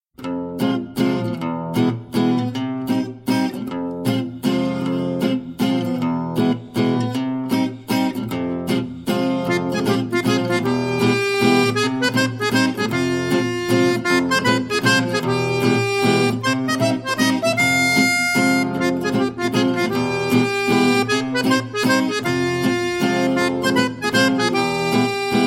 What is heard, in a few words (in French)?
valse lydien 3m6aug